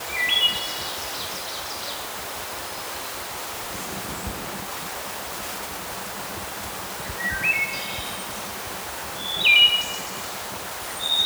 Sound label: wood thrush